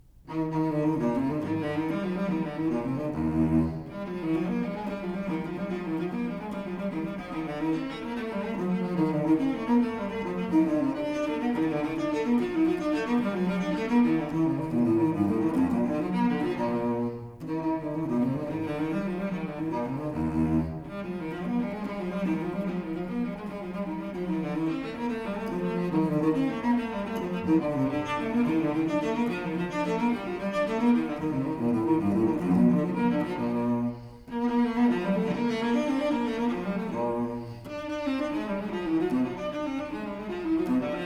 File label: Suite for Solo Cello No. 4 in E flat major Gigue; BWV 1010; BACH